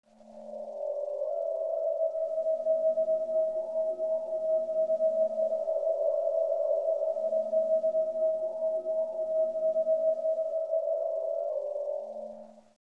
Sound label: flutey strain, Rumbles